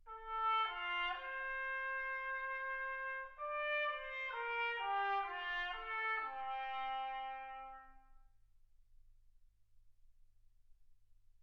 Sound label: trumpet